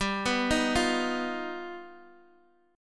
G Augmented 7th, Guitar Open G

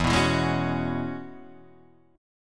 E 6th Add 9th E down

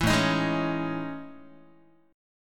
A Major Sharp 11th Guitar; AM#11 strummed; 7,5,6,6,5,x; 4A,4E,5A,5D,5G#,6C#

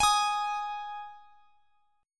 G sharp 5th G sharp; G#5; strummed; x,x,x,x,21,23

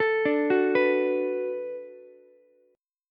D 6th D down Ukulele, chord; D6 arpeggiated; JGuitar

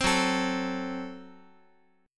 Gb Diminished Guitar; strummed; JGuitar

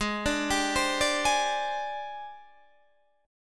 G sharp Major 7th Flat 5th Guitar Open D; 18,17,17,18,17,18

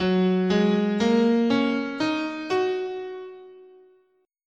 F sharp Major Pentatonic, scale

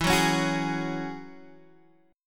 G sharp 7th Suspended 4th Sharp 5th

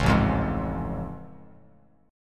A Augmented Major 7th A Bass Standard; strummed; JGuitar

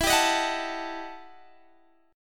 E Minor Major 11th, Guitar Half Step Down

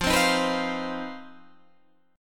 C Minor Major 7th Double Flat 5th F, chord

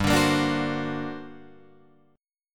G Minor Major 11th, G, Guitar, Drop D

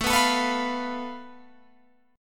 A Suspended 2nd Sharp 5th, Guitar, Open F; strummed; JGuitar

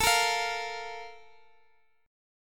Bb 5th A; Bb5/A strummed

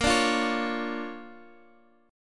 D Minor Major 7th Sharp 5th, Bb, guitar

